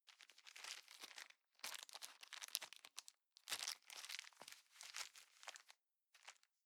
plastic bag small rustle long soft

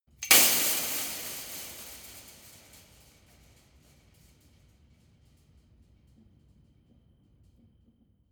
metal impact fence long

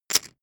poker chip stack rattle short

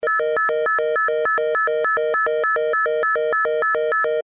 control sound

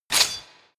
sword flesh cut

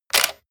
camera

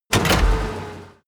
tank medium gear shift

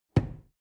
drawer medium close lock

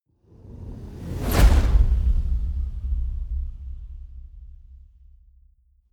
sci-fi flyby plasma

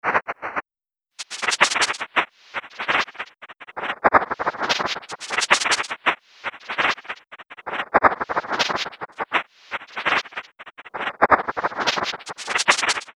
broken narrow noise

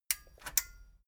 metal button stereo click disable slow

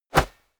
basketball hit basket

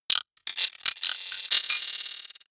sci-fi electromechanics small stutter tonal

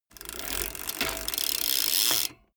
bmx bike pedal once freewheel brake

